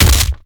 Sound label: Collisions Melee Impact; Lurker; Evolve; Gorgon